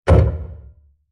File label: Short Stab; Miscellaneous Impact Sound Effects; Miscellaneous Impact (Sound Effects:Impacts)